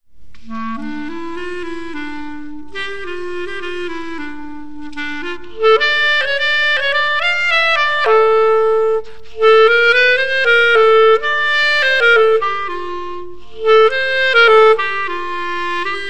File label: clarinet